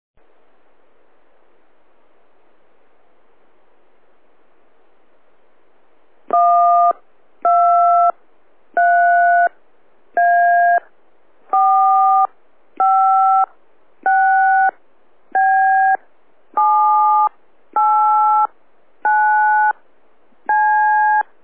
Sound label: VX-7R DTMF